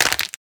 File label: tool breaks; Camas